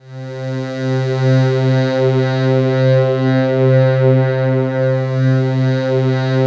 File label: PAD, Drum & Bass, 160 BPM; Ueberschall; Drum & Bass Flash Trackz